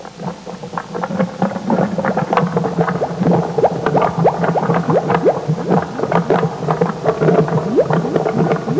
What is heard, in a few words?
sink empty, household sounds